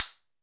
MARIMBA C 5; AKAI S-Series CD-ROM Sound Library VOL-7; MINI MARIMBA

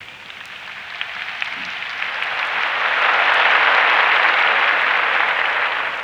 ATMO R, Drum & Bass 160 BPM; Ueberschall; Drum & Bass Flash Trackz

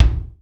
DANCE SET, DRUMS

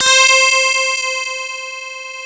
DS8 C 5, Korg; Back In Time Records; Korg Universe VOL-1

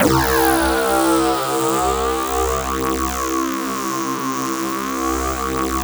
RING BASS; Spectrasonic Distorted Reality 1

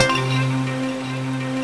WET, WATER; AKAI S6000 CD-ROM - Volume 1

